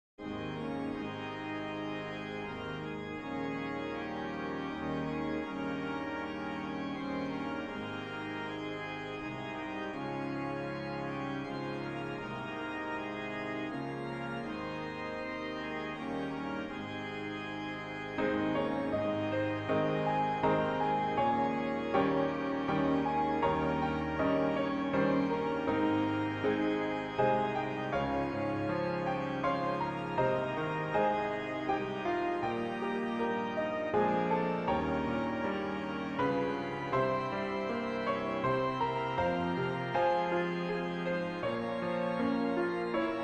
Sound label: instr for recording